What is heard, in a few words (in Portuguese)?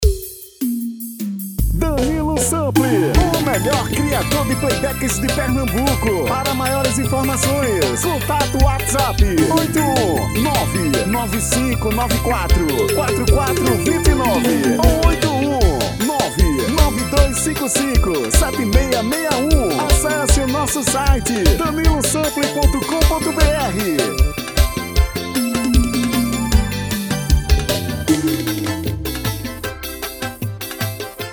Piseiro